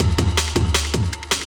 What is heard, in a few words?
DRUMLOOP D+B 160, Drum+Bass Loop; eJay